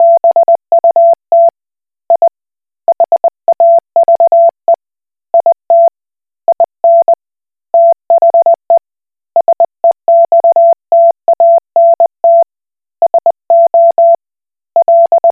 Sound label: Koch Morse